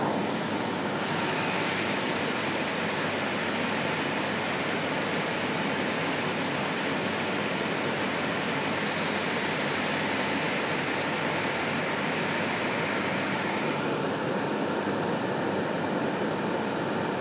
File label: sound of a thousand servers